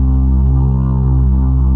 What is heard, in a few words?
vocoder voxy loop; Vocal